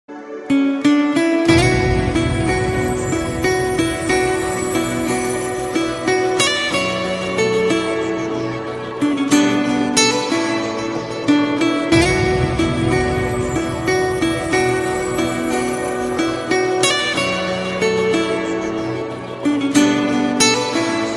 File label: New Nice Guitar